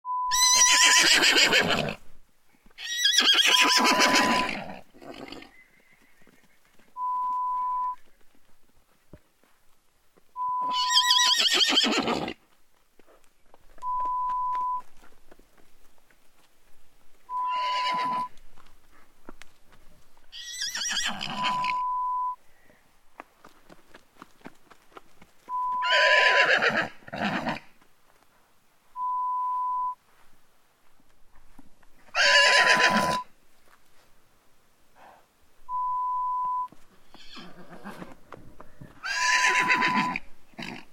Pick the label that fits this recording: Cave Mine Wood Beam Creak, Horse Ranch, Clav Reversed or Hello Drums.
Horse Ranch